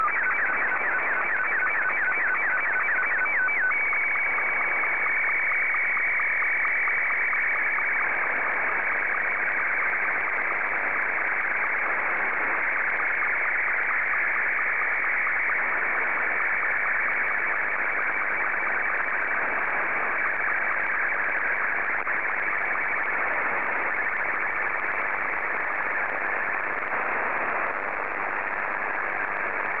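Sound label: SSTV, Martin 2; VK3EME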